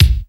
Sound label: AX MIX BD; AKAI S6000 CD-ROM - Volume 1; DRUM RYTHM